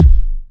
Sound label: Ultimate kick